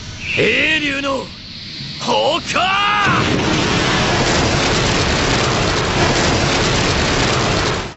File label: shadow roar; gbombs5; ambience